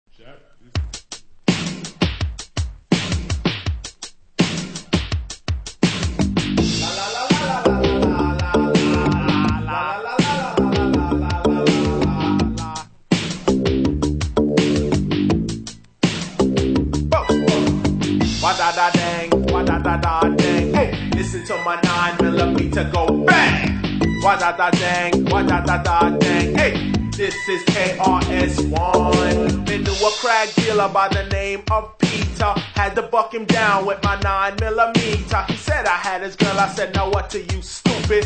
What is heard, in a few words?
VOCAL